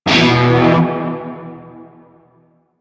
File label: Shawzin Chromatic Chord; Warframe; Narmer Shawzin